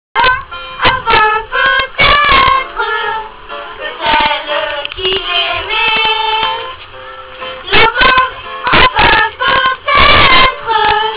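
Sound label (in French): chant et applaudissements ouaga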